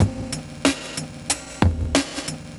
Grain Beat, Drum Loops

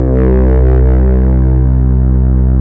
SUB C2, SYNTHESIZER; GLUNGE

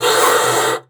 PUSTE, BASSOON; Xsample VOL-6

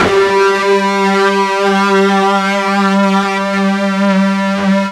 FUZZ G 2, Guitar; East West; Steve Stevens Guitar Samples Collection

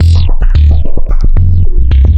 Bassline; Drums & Drones

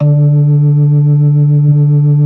B3 D 3; Best Service; Hyper Dance

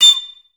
STAC A6; Best Service; Peter Siedlaczek's Advanced Orchestra

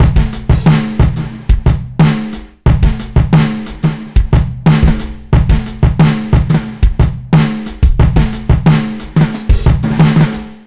090bpm, Downbeat Dance Grooves

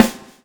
SWNG

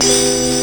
HIT, Hits & Brass

